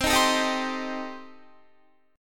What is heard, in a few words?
C Minor 6th C Guitar; Cm6 strummed; 20,18,19,20,19,19